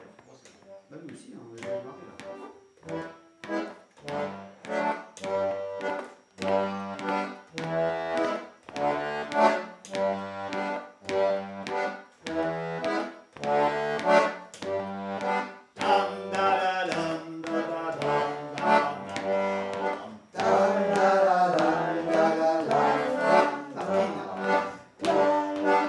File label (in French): accordeon; festival